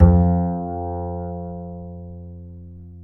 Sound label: F 3, Bass; Spectrasonics; Bass Legends